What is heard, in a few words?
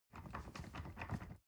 ladder wood rattling